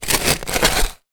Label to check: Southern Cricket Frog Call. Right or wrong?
Wrong